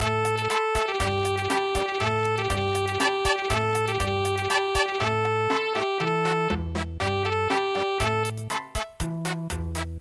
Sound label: Cha Cha Cha